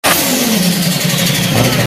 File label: R34 Turbo Flutter